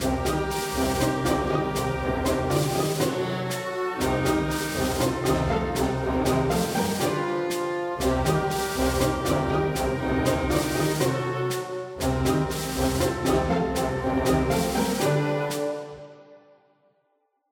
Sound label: Military Snare Pizz; tintin reporter cigars of the pharaoh